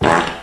fart short

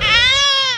ah child pain; Voice; ooh ahh

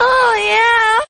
"oh yeah" orgasm

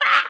ack; Voice; ooh ahh

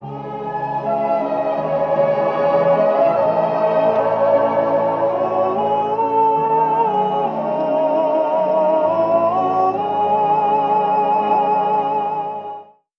strings and voice, sad; loops

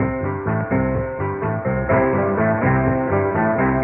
piano, Loops; Phonky

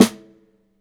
Snares, Real

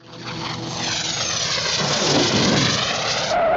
plane land skid

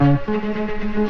strings, Loops; Phonky

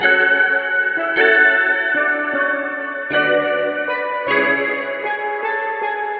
keys

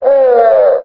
"ah" muted; ooh ahh

